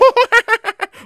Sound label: Monkeyboss Laugh; angry birds rio